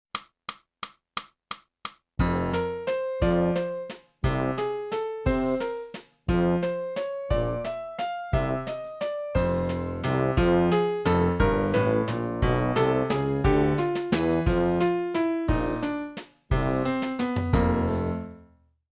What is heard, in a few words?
sax, chromatic cheesecake